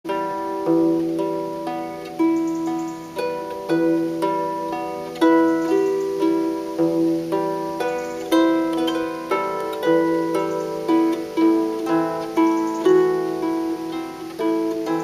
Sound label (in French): instrument